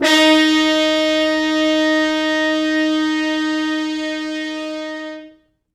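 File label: French Horns stops, Quantum Leap Horns Sax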